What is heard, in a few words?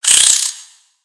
Spider Attack Melee; creatures mechanical; Lego